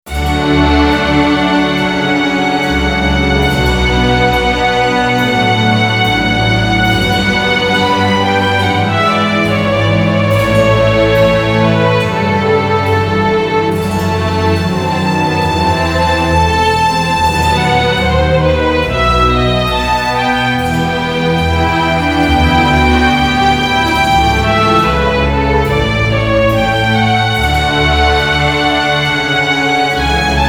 Klingelton Bach Air

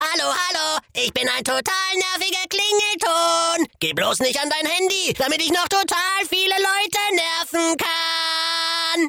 Lustiger Nerviger, Klingelton

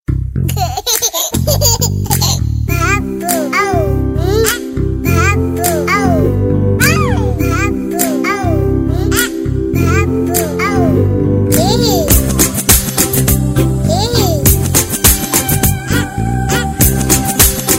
baby abababo, Klingelton

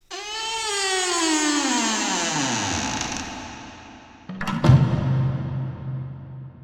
quietschende tur

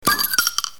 flaschenoffner bier offnen gerausch

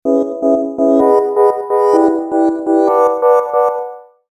Klingelton synth

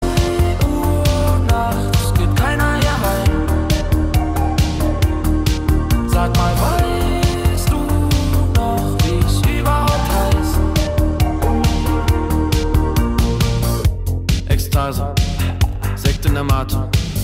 Tanzalarm

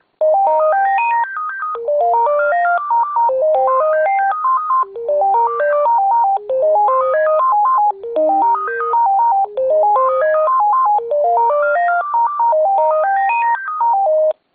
Gigaset Klingelton

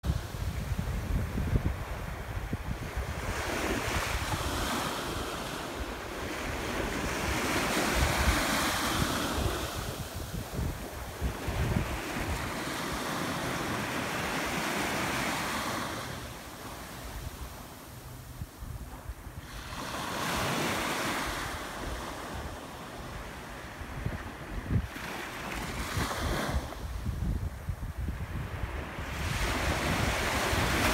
meerrauschen

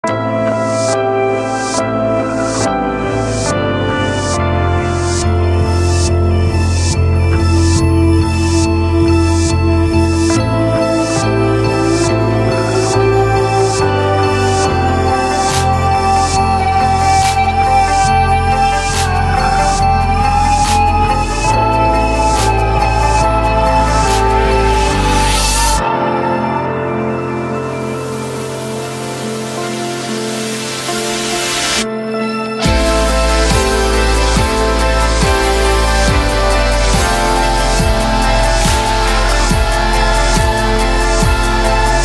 Cavendish Musik

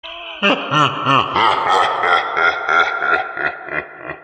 boses lachen

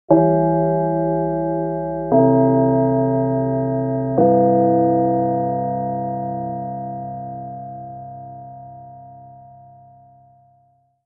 Schulgong

